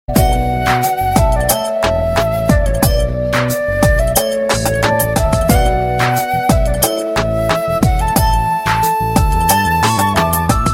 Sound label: flute effect